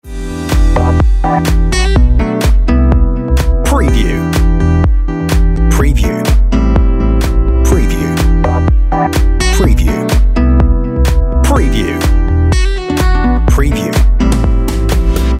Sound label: On Hold Music Some Sweet Day